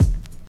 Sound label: present bass drum sound e key, kick drum single hit tuned to the E note; kick drum samples